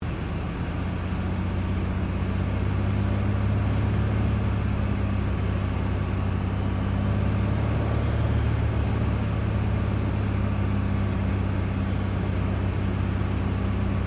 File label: AIRCRAFT CT4A TOUCH AND GO INTERIOR, military trainer aircraft; Airplanes; Vehicles